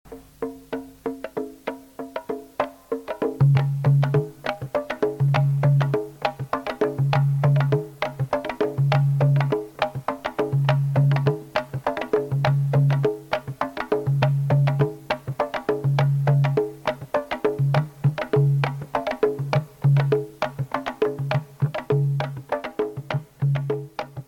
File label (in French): musique Rythme de tambours bata; tambours bata a Oba Iluyenkori